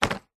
household vacuum cleaner set down on tiled floor while off